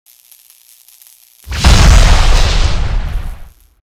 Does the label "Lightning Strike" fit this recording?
Yes